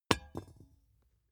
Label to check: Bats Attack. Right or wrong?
Wrong